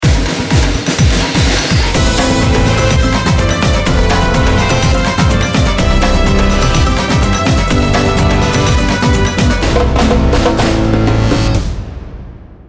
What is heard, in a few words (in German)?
olympia ringtone